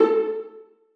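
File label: violas pizzicato a4; Sonatina Symphonic Orchestra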